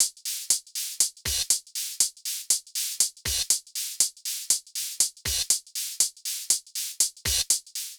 Hh Sh Loop 120; Equipped Music; Loungin House

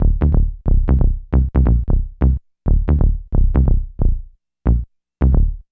BASS LP

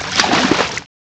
WATER; CMI Sound Library Rev 1.3

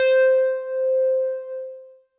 Synth C, Synth Prophet One Shots; Electronisounds

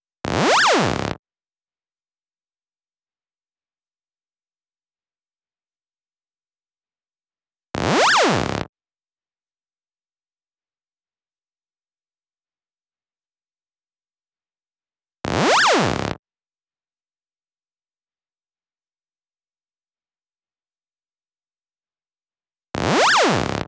128 BPM FX Lazer Fill; Vengeance - Essential Clubsounds Vol.5; Demo Constr Kits; Sidewalk Kit Root G

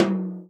TOM, Real Drum Kit; Best Service ProSamples vol.43; JUNGLE KIT

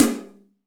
ROOM TOM; EdgeSounds; Drum Mashines; R-8 DRUMS

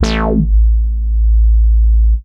dance, bass

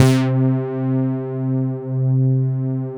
TS 10, Analog, Synthesizers; Soli-Music; World of Synthesizers VOL-1

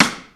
stick rock, Yamaha Motif ES-Series; 300 Drum Machines

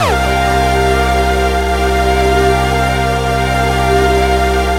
JV-1080

